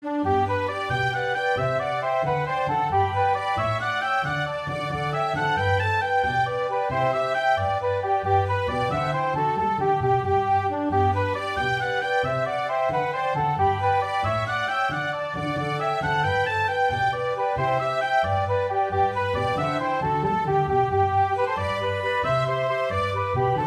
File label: Traditional Jigs, These three jigs form a good set for dancing; folk band